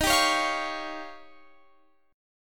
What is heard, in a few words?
D sharp 9th D sharp, Guitar Open F; D#9; strummed